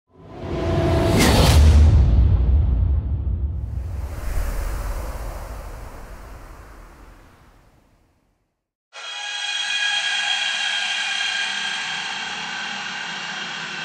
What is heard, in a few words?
horror screech